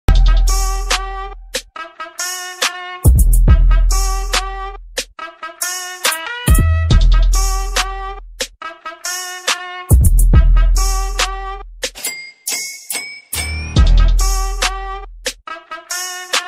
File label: BGM korean ringtone